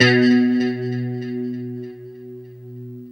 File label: LO E B 2, Guitars; Spectrasonics; Hans Zimmer Guitars VOL-1; JC-SHEEN